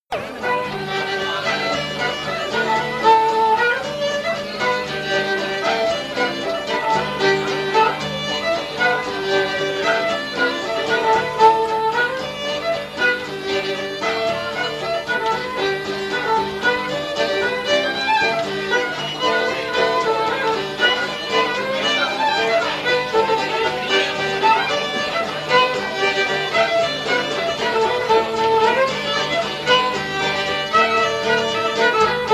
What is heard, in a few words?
fiddle; maurice okeeffes; ballydesmond feile cheoil 1976; world fiddle day scartaglin